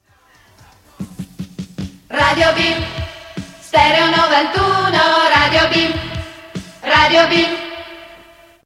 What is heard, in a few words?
Jingle; Radio Bim